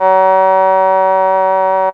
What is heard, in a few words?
Oboe